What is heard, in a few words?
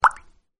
water droplet